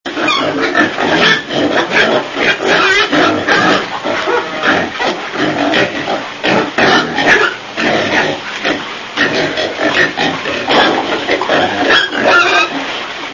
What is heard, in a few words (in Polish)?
swinka, zwierzeta